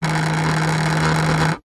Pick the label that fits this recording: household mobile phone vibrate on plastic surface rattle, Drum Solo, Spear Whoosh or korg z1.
household mobile phone vibrate on plastic surface rattle